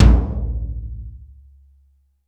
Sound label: ROOM T, ROOM KITS; Crypton - DrumBase5000